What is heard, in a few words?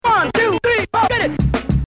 scratch